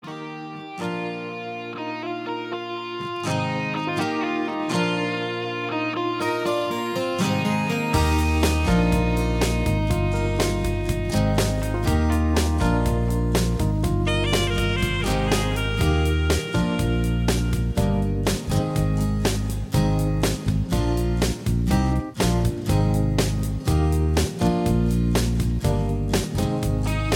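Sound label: Instrumental without background vocals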